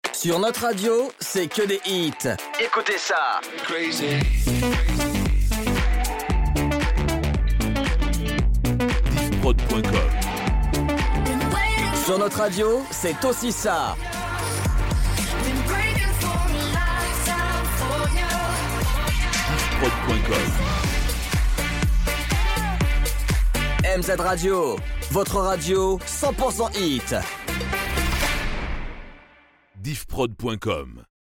Radio Medley Hits

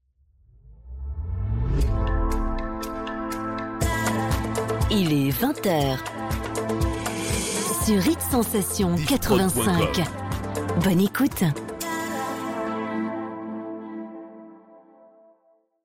Top H Deep; Hit Sensation 85

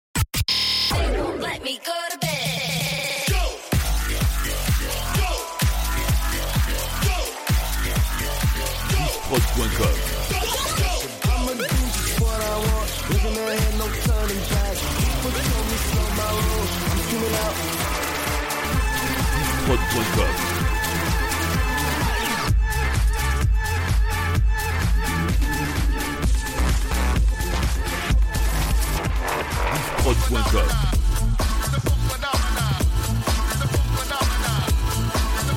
Medley Electro 2010 IDM

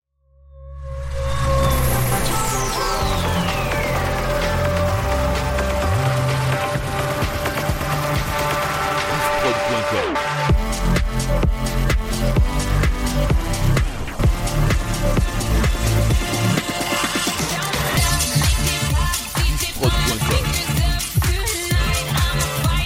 Medley EDM IDM

